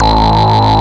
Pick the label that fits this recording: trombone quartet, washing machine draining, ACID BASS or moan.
ACID BASS